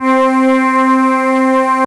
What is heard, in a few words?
C Synth, One Shots